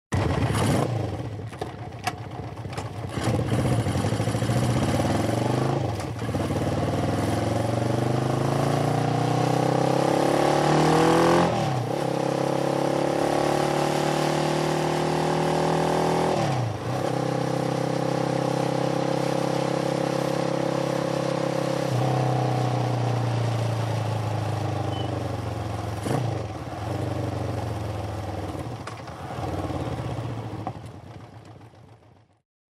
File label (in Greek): Mesa sygoinwnias Mhxanh